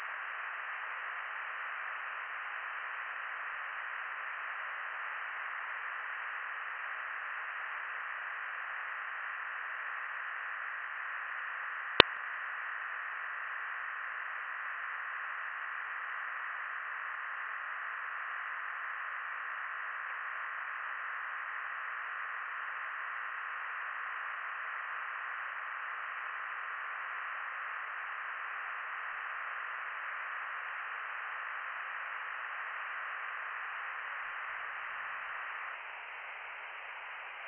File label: from radio, 7177KHz